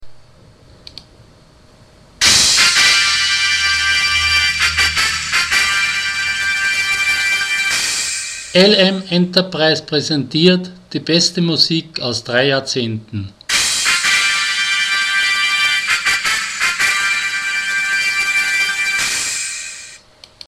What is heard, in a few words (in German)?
Kennmelodie